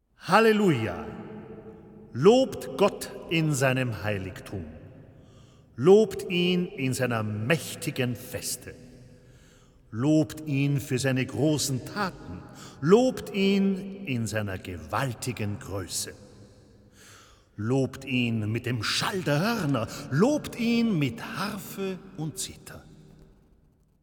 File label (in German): klangerlebnis orgel